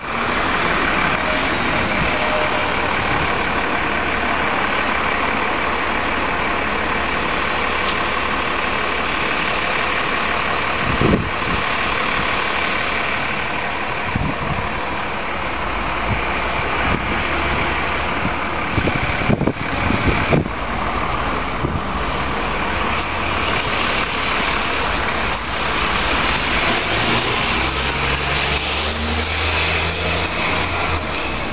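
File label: oxford road manchester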